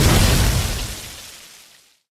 flamethrower hit; Original TK Sounds

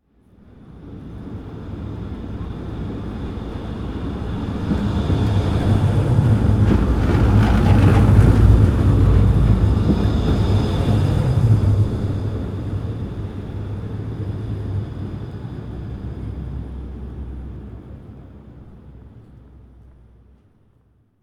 tram passing; Golden Crayon Sounds

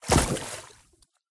water step; Golden Crayon Sounds

